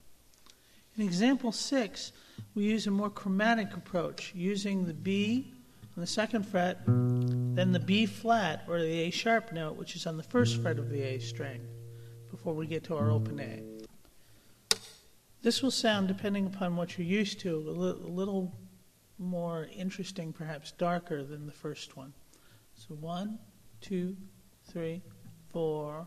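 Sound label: walking bass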